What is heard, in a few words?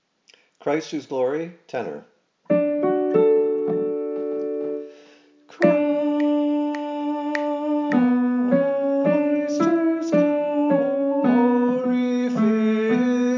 Christ Whose Glory Tenor